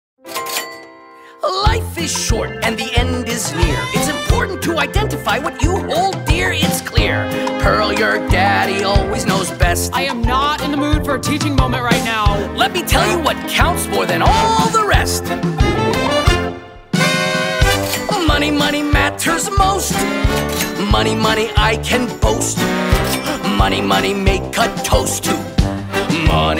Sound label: with Vocals